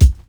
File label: raw kickdrum c key